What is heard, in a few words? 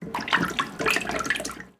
Water Splash Toilet Water; SFX Kit; Foley - Misc